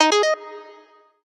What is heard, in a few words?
alerttone zap; The Light Phone II